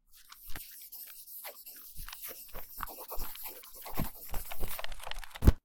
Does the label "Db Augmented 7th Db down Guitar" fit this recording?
No